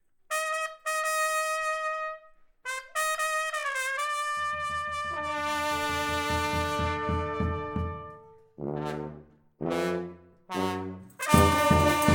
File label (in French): fanfare